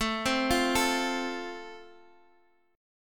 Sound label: A Minor Sharp 5th, Guitar Standard; 17,15,15,14,x,x; arpeggiated; JGuitar